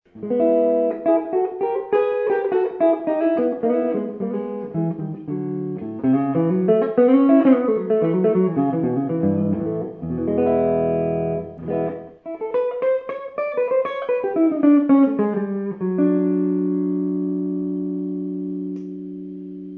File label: white neck, guitars; Vintage P-90 Neck